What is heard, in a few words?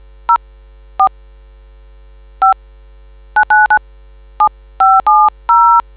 dtmf